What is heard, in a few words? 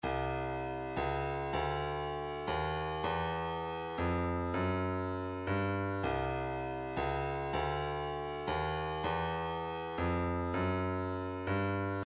kick; 160 Slap Shot